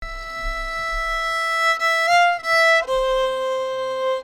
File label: Violin Bit